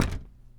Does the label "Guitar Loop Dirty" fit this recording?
No